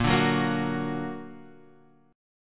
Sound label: Bb Augmented Bb Guitar Half Step Down, chord